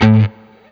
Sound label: BLU PICK, Guitar & Bass; 90 s Sample CDs; Quantum Leap - Guitar & Bass